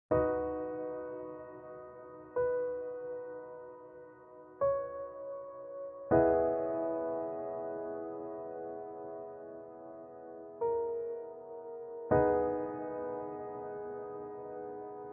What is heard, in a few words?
Ending